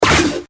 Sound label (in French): frappe